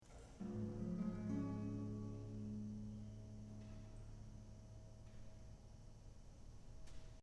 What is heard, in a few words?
Gmb strum C sharp